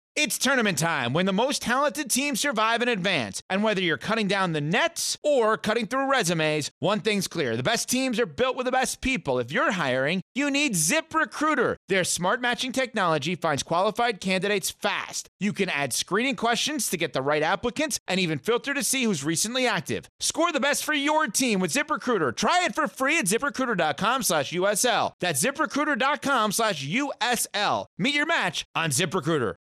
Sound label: ZIP SCORE